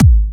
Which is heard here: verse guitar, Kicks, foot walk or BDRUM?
Kicks